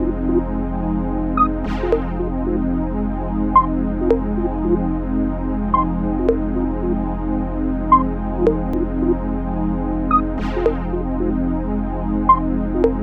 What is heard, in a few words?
Pad, Trance; Sonic Foundry (Sony Creative Software); Trance NRG VOL-2